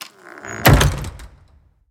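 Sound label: wood single light close, door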